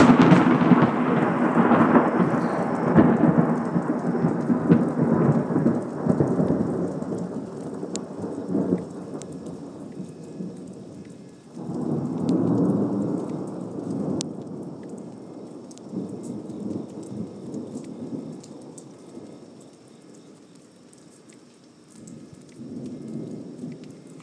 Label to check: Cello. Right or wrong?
Wrong